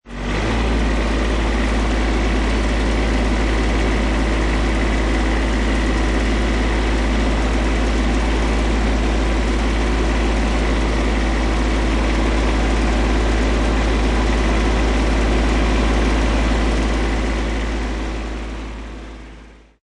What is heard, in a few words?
Tractor Deisel Driving On Board Perspective, Dielsel tractor start up, manouver, driv; Tractor: Dielsel tractor start up, manouver, drive away; Tractor Sound Effects